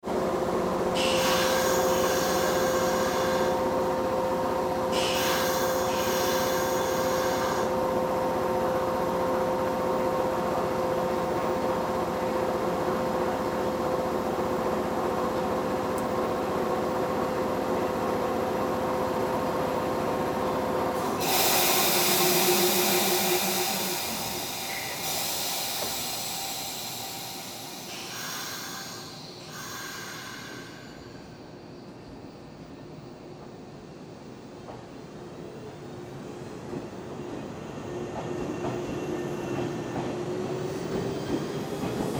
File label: train departure